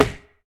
Hit Wood Generic

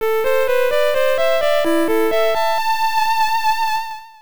Flute of Wind; Ys IV The Dawn of Ys; PC Engine Super CD-ROM2

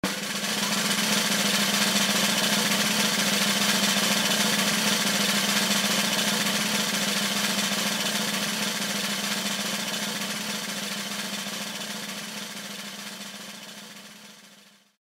Drum Roll (Minigame)

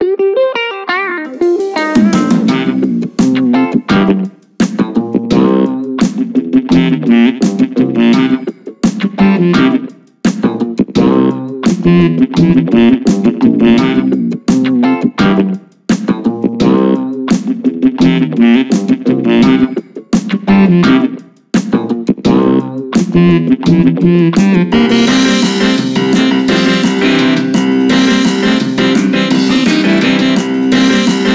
BGM Police; tamagotchi no doki doki dream omisecchi 3ds

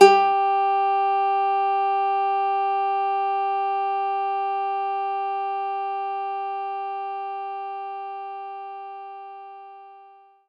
Nylon Guitar G3; knights try

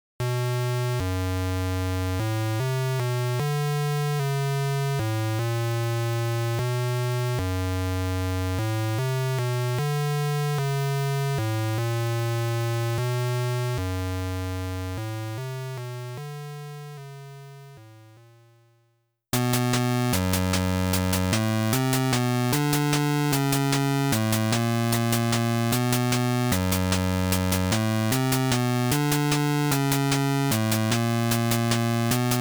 Room BGM ~ Boss ~ Damage ~ Game Over; Famicom 20th Anniversary Original Sound Tracks Vol.3

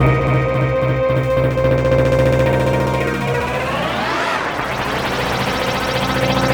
Disco TransitionFX

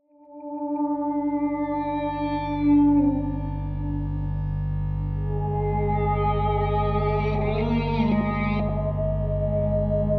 Low Dark Guitar Theme; Military City Camera Move

